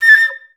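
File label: flutes A5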